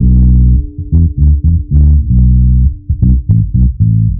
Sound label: bass deep inside, Loops, Phonky